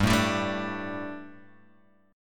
G Suspended 2nd G, down, Guitar, Open G

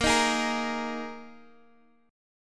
A sharp 7th Suspended 4th, Guitar, Standard; strummed; 18,18,18,15,x,x; capo=2

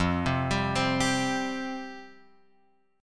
F Suspended 2nd F Guitar Open F; arpeggiated; x,0,0,2,3,2; JGuitar

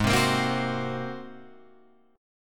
G sharp 9th, Guitar, Open F; G#9; 8,7,10,7,9,7; strummed

